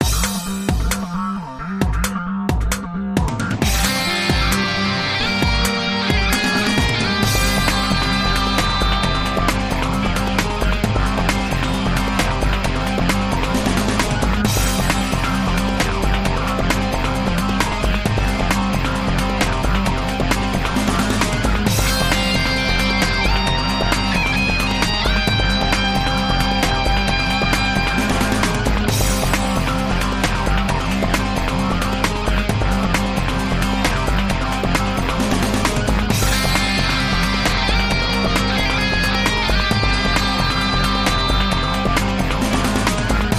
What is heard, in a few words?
Breaking News Promo